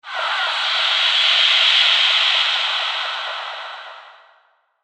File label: Vampire Nightmare; Monster Sound Effects; horror sound effect; Andrew Fleming; Twistedtracks